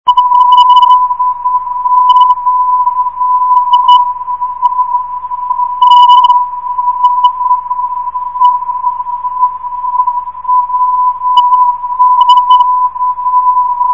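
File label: lq 344khz, non-directional beacon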